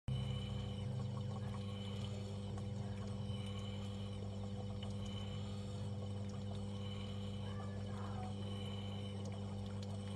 dendrobates auratus green and black calling

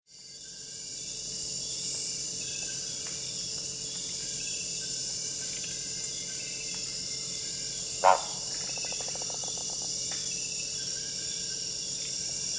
Phyllomedusa Bicolor Calling

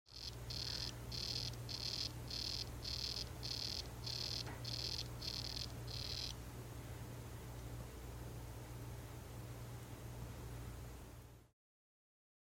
ranitomeya ukarii calling

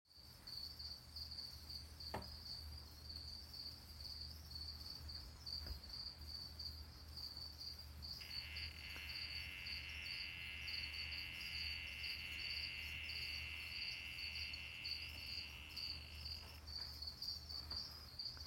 Adelphobates galactonotus calling

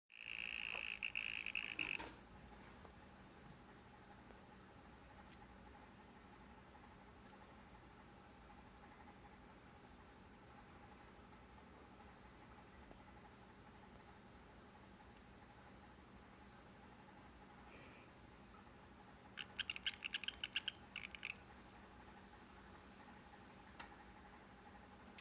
dendrobates auratus super blue calling

